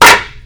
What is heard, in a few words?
wood plank impact hard; physics